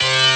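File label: Rave Synth, Dance Mega Jungle Rave; Best Service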